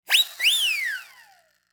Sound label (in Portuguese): CORNETA